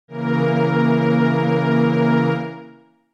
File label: Bb Bb Diminished